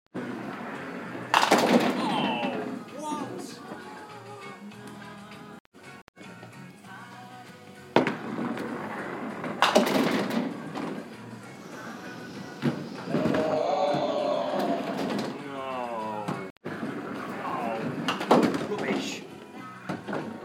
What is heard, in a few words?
bowlingo